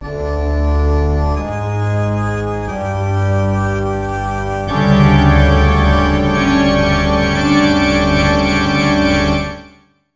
Horror; Ripened Tingle's Balloon Trip of Love; Nintendo DS

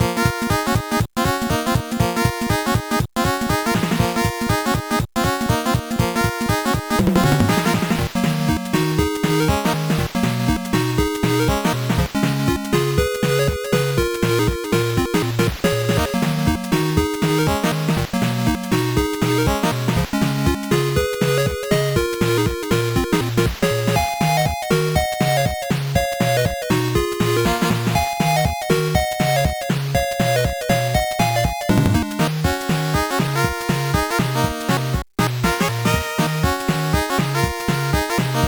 Stage 2 BGM; S.C.A.T.; NES

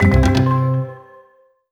Perc 3rd Star

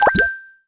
Bell Whistle; LEGO My Style Kindergarden; macOS; Windows

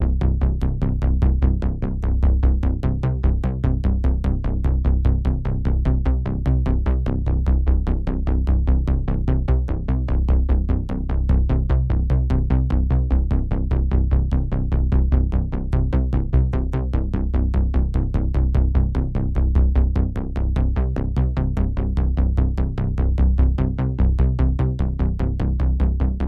Bass Loop

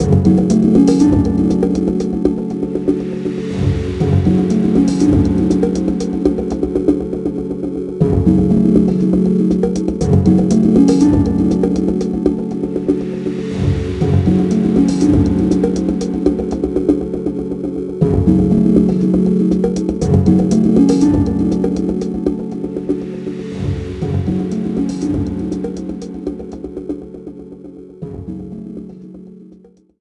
Music Slight Tension; JBond; 007 Quantum of Solace; NDS

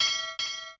buoy; super trench attack

